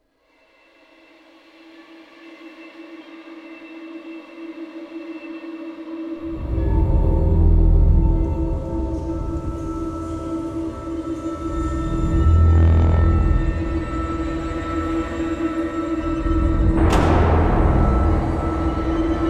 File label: horror